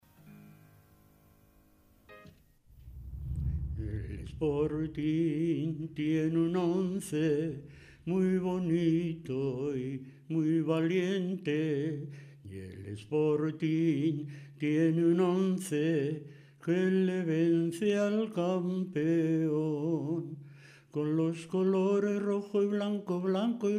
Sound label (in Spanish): Himno